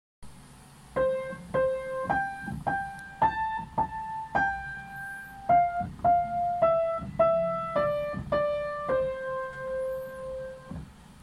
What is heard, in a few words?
Normal Twinkle